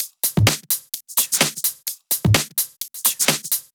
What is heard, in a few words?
Drumloops 128BPM